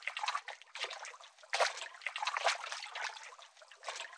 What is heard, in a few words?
lap